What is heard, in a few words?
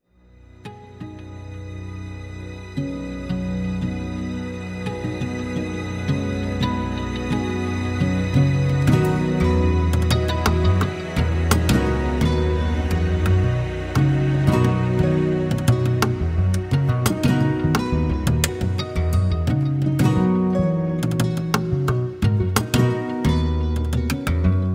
UNPLUG GUITARS